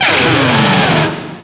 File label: cry; source of the sovereign